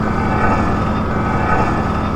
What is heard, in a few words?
sewers elevator loop